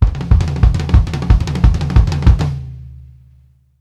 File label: LOT A DRUM R, Drumworks FILLS 96; Sampleheads - New York City Drumworks VOL-1